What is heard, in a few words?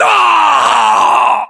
death, voiceovers; english